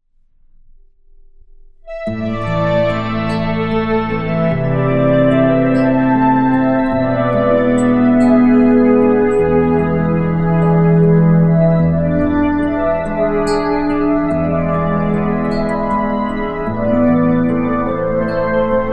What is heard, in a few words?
strings tune with guitar